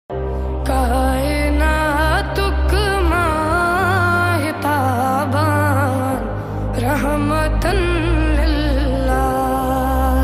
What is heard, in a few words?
islamic ringtone naat ringtone qawwali ringtone arabic ringtone